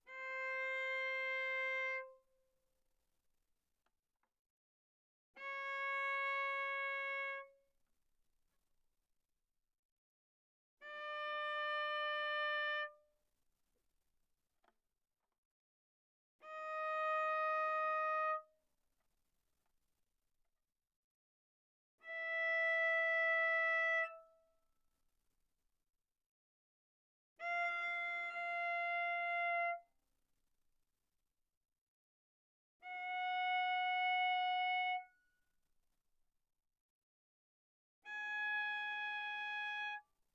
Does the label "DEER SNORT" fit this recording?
No